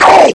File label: pain; svencoop; scshogo; fallenstrooper